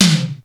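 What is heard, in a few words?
tom mid; xr10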